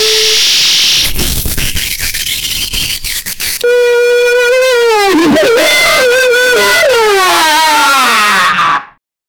zoooot speeed; speed

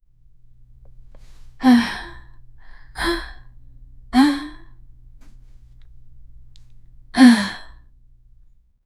women gasp in shoked; female

